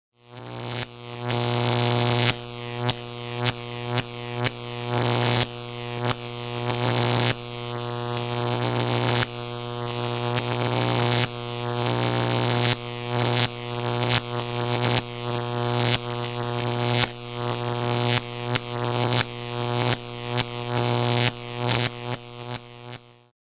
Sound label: Звуки Электричества The Sound Of Electricity